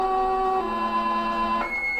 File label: Fire Alarm Dispatch